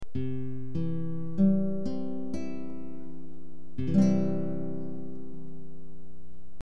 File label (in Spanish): acorde do, guitarra